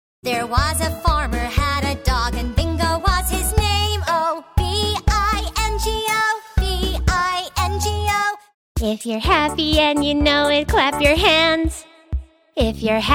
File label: USA SONGS